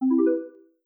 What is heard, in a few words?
finish win